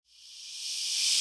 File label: Choir